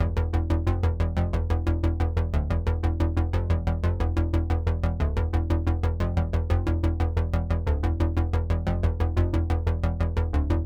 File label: Bass Sequence Danger 4 Bars; Vostok Inc.